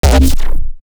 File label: Artifact, Electronic